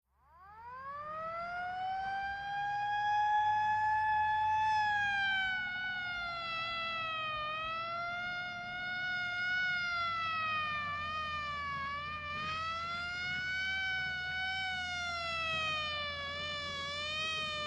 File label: Fire Truck Slow